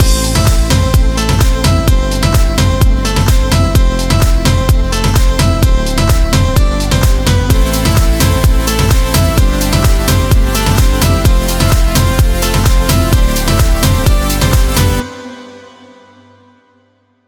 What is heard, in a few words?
128BPM Mix; Notice; Vengeance Samples; Notice Kit